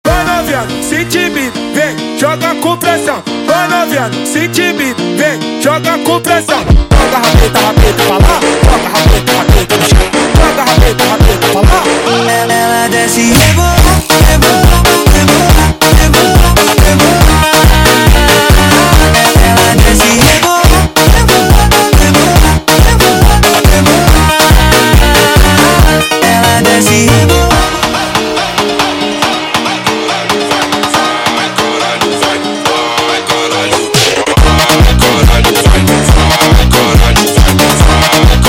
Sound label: Montagem sped Up